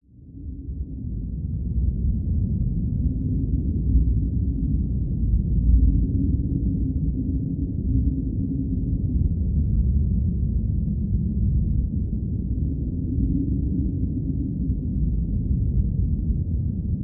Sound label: nature wind constant strong howling dark storm